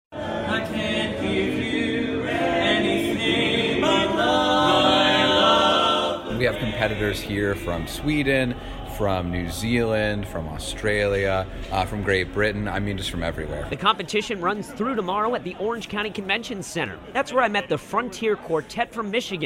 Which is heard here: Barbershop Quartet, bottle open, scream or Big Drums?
Barbershop Quartet